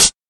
old school low treble pedal hi hat sound c sharp key